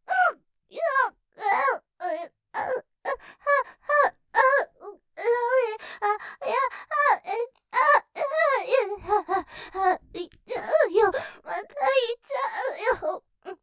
喘ぎ いっちゃうよぉ；またいっちゃうよぉ, ASMR; ステレオ; ループで楽しむ！シェフの気まぐれ抜き抜きコース

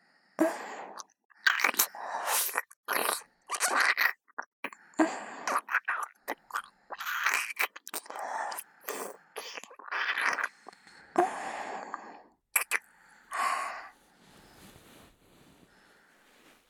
耳なめ 右, ASMR; 真夜

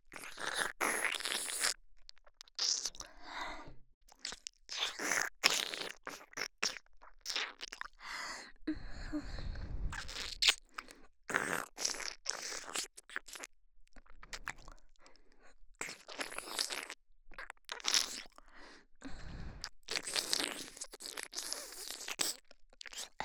耳舐めオンリー (右耳)

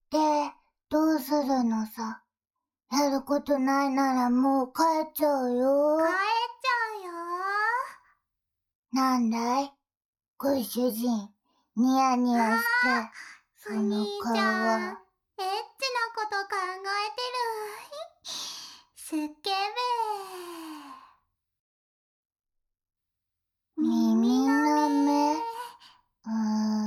二人の少女と耳舐め, ASMR

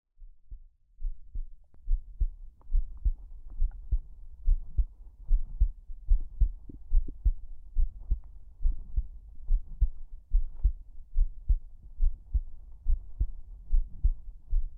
心臓の音

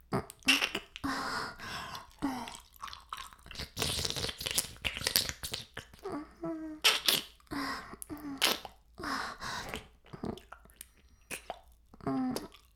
耳舐め音声(LR逆); 白くて黒い魔性円環

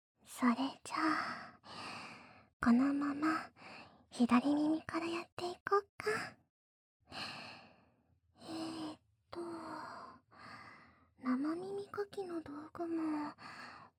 左耳をエッチに 生 耳かき♪

